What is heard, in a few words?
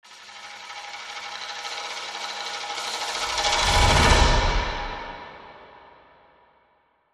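Tambourine Stick Crescendo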